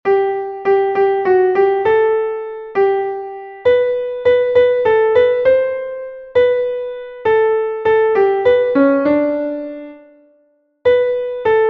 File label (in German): klavier; bunt sind schon die waelder; melodiemeister